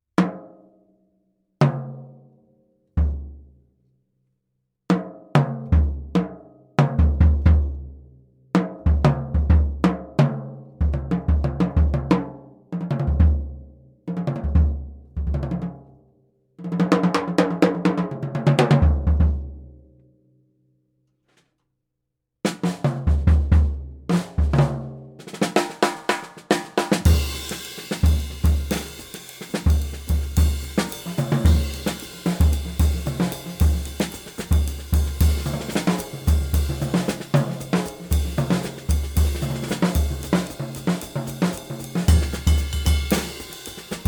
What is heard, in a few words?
Slingerland Bop Kit, drums